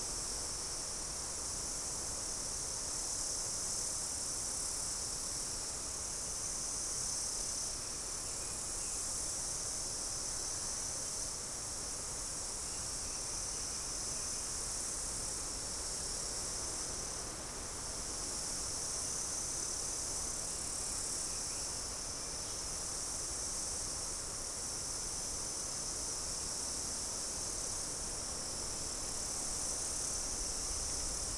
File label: mainly insect cluster